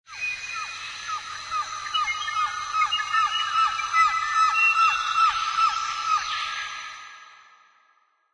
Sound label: animal call bird; Source Engine; tf; lair